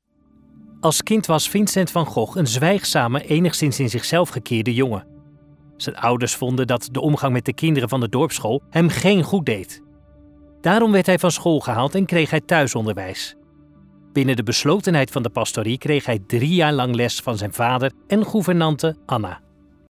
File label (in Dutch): Muziek; Vincent v Gogh